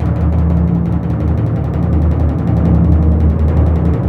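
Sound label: timpani, Synclavier Percussion; ILIO; Synclavier Percussion 2; World & Orchestral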